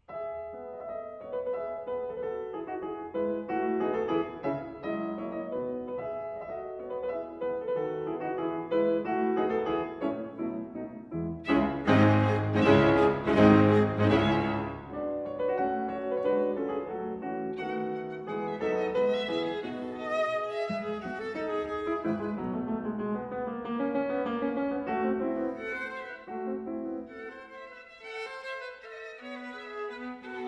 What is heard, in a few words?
piano qt; kiel